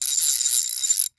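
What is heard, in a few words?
Ratchet; 1001 Sound Effects; Tools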